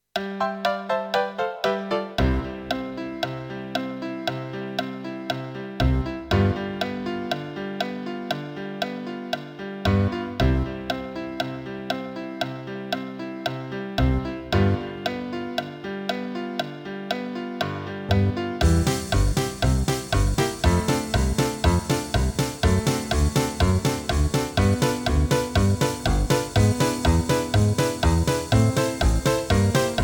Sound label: Do Re Mi Piano